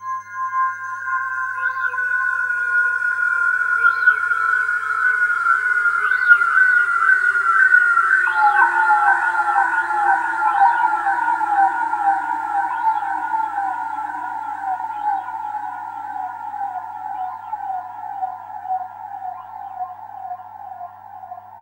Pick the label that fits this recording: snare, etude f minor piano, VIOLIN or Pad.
Pad